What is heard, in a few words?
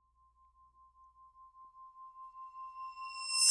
Swell Metallic Ring; bottlerocket